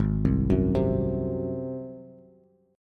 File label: G sharp 7th, Bass; arpeggiated; chord; JGuitar